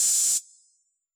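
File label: analog hi-hat open; AnlgKit1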